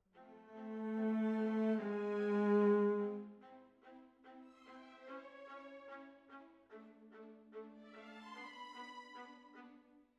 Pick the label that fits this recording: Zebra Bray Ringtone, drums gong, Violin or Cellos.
Cellos